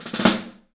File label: STEEL ROLLS; 3D Drums